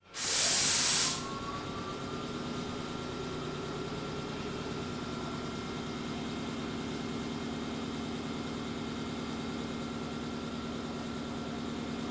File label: train parked